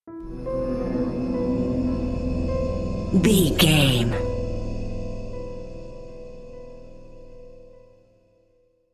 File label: dark desolation sting